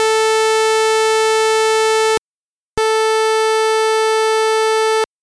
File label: sawtooth, LSDj Wave Synth